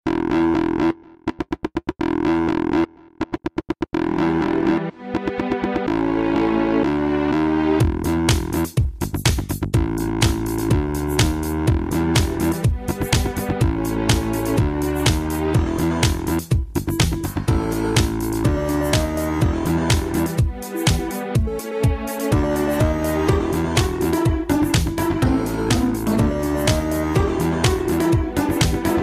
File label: Electro